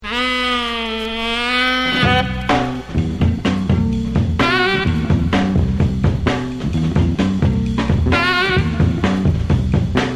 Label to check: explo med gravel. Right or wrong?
Wrong